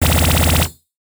consecutive impact metal; ボードゲームやカジノ、麻雀の効果音です

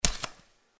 Footsteps Barefoot One

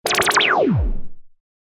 consecutive impact, mahjong; ボードゲームやカジノ、麻雀の効果音です

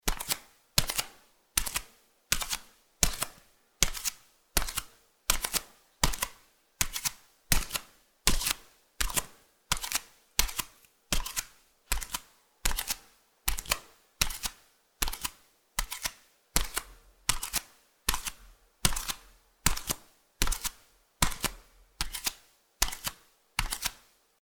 Footsteps Barefoot Walking

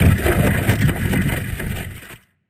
earth magic middle, magic spell; 魔法/法术的音效

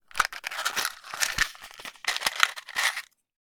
Take a match out of the box

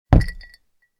Put down the glass with ice

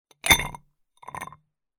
Putting ice into glass

